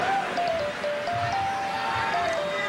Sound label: Chime; Nba Draft